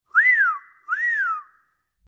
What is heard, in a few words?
calling whistle; garrysmod; prop hunt; dutch